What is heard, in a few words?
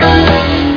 duh dum; music samples